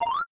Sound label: Jump